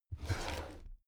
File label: drawer cabinet crammed open regular halfway